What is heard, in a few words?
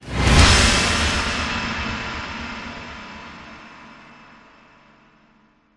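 sting guitar chord whoosh hit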